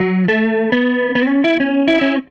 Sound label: Gretsch Guitar Loop